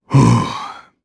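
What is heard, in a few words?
Vox Sigh, Japanese; kingsraid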